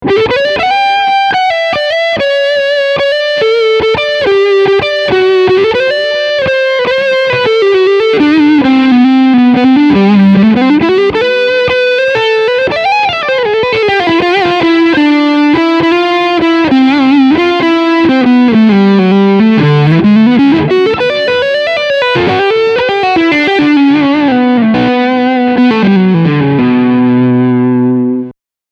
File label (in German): Crunchsound Mittel PU